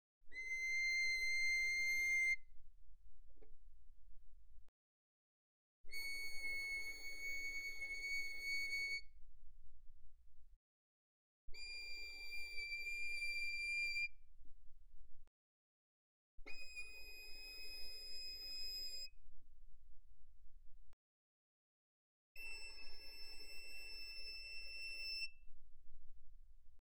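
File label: Violin arco mezzo forte sul E C7 E7; Strings; violin2012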